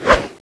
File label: knife slash